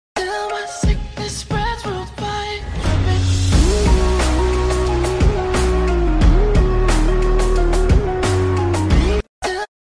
special thunder sound ringtone

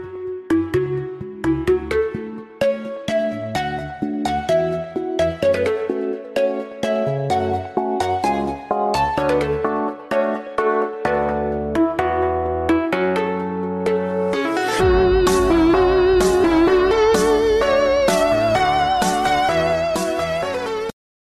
electro game ringtone

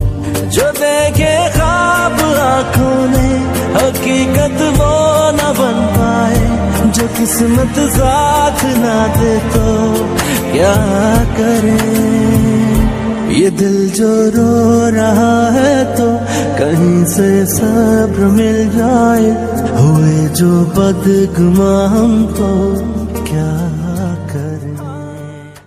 new Pakistani ringtone

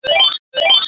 alarm ringtone, sms ringtones; android

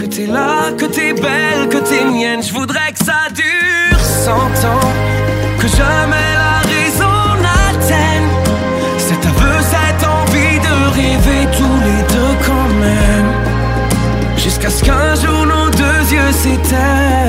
hollywood ringtone

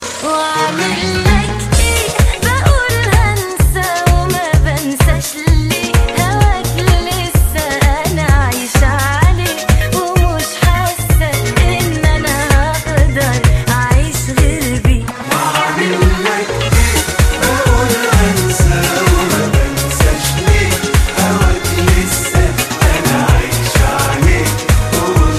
music arabic ringtone

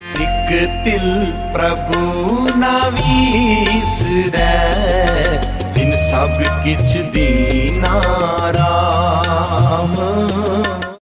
punjabi shabad ringtone

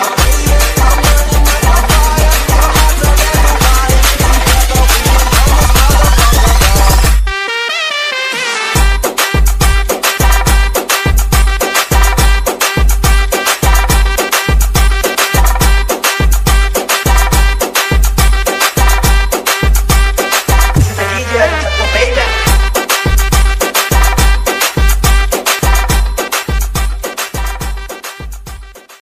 English ringtone

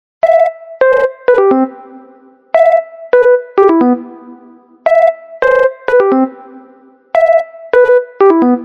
bling ringtone; xp

